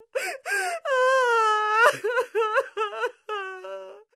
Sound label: crying, Human Female